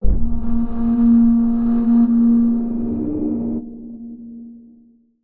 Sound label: bone flute low